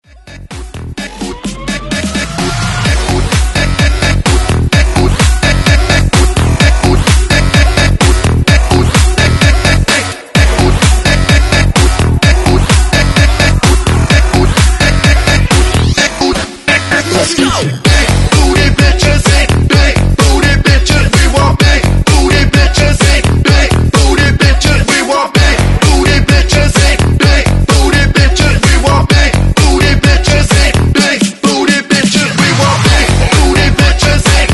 Bounce 128Bpm; DJDOLLS; Redrum; remixplanet

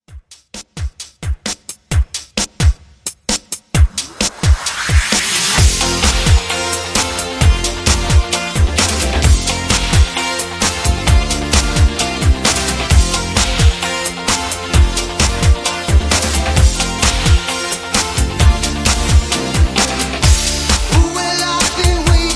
80s Pop Rock Redrum

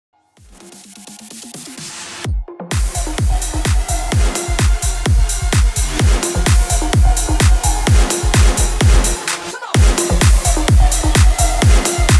128 BPM

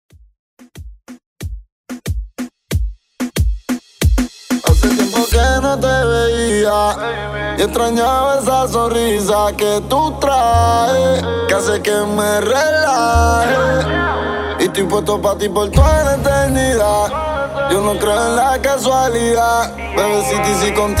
Reggaeton Redrum

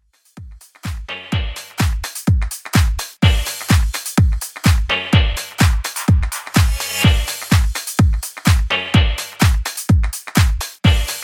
Dance House 126 Bpm

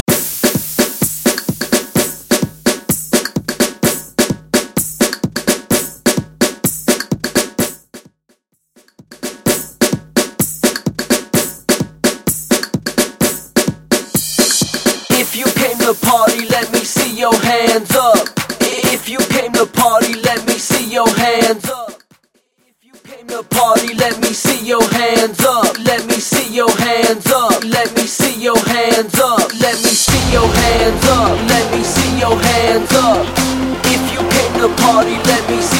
EDM Club Edit Clap A Pella Starter; Mainstream VIP; Vegas Edit; 128 Bpm

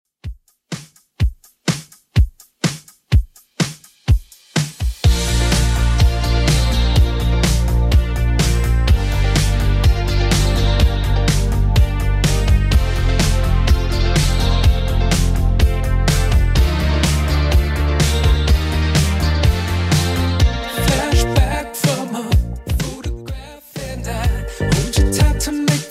80s Redrum 125bpm

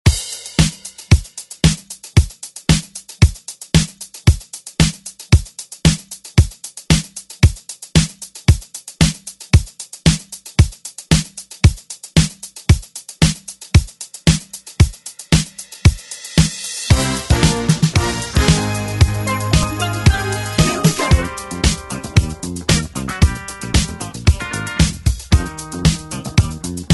80s ReDrum; DJ Mhark

